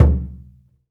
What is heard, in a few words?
African Perc